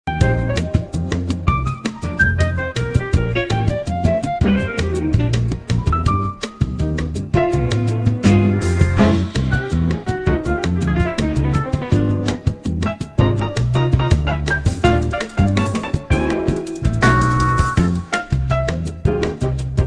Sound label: jazzy elevator music; AI Test Kitchen